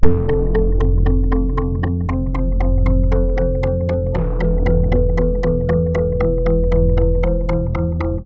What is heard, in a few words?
Synth Poly; TSMtoolbox